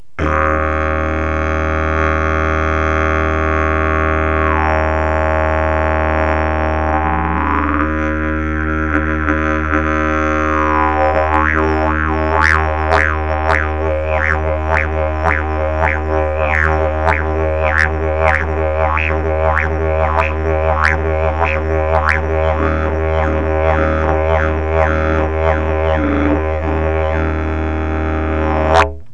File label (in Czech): jilm, didgeridoo; jilm had